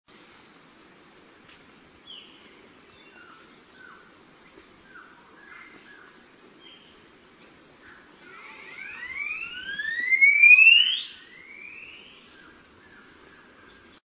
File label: Penenigra